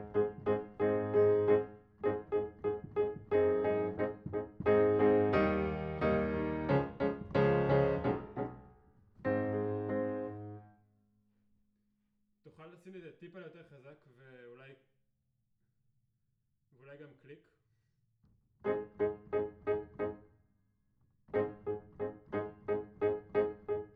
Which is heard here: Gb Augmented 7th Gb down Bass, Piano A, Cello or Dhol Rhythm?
Piano A